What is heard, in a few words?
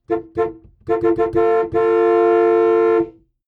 car horn chopped interior hummer h3